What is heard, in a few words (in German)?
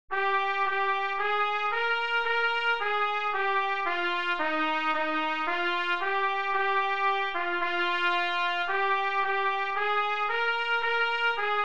Trompete; Freude